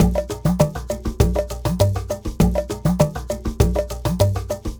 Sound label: Ensemble, Latin Percussion